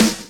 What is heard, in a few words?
DRUM CHOP; AMG Gota Yashiki Groove Activator